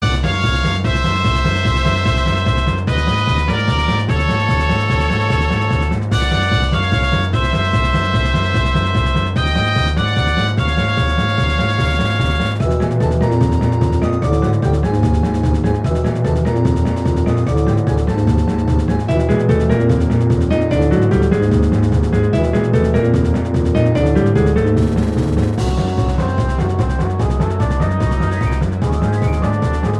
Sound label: Fight Music; Volvagia